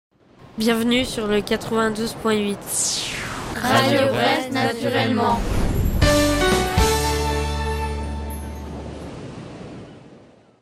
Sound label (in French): jingle été; Louhans